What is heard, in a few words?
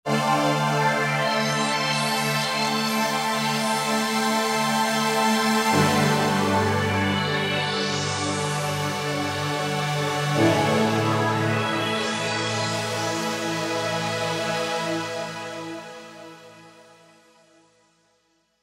Rez Motion Sweep, Korg TR-Rack